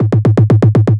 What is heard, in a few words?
Kick, Trance, Drums; Sonic Foundry (Sony Creative Software); Trance NRG VOL-1; Kicks